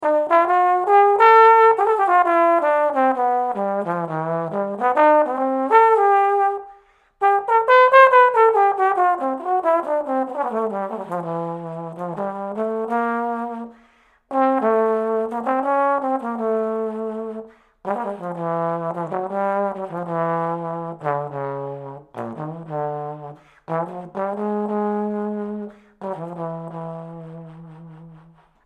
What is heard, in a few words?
Trombone